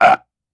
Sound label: Belch Regular; belching